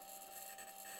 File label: mic, mars2020 edlcam